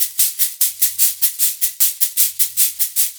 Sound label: SHAK, Percussion Loops; 150 COMBI